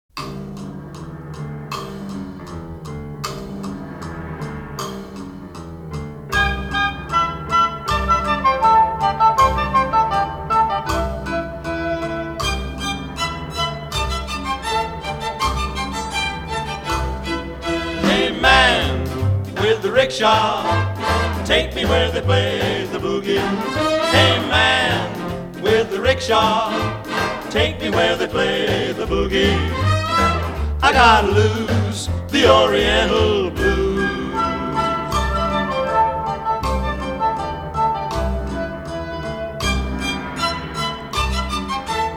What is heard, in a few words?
oriental blues, orchestra